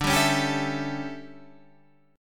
D Major 7th Suspended 2nd Suspended 4th Guitar Half Step Down, chord